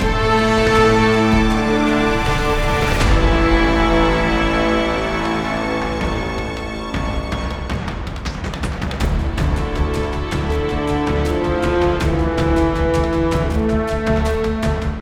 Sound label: Mood High